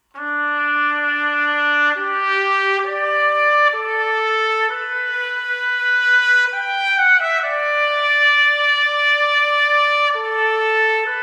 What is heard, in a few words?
Military Trumpet